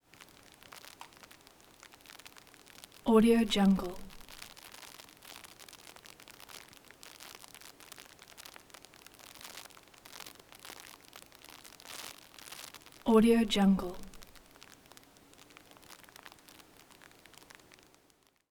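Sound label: bubble bath bubbles close up low level popping; prosoundfx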